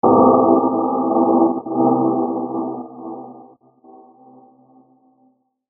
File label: Submerged Synth A Mel